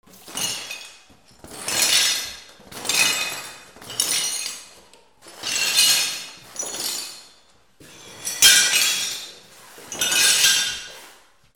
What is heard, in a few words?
BREAKING